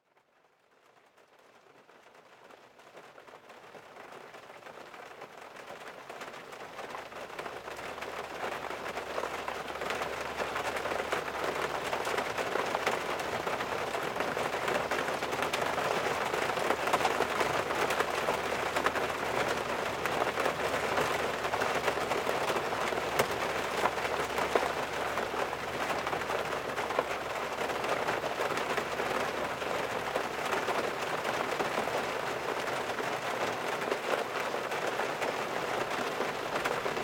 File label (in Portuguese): Barulho De Chuva Sons de Chuva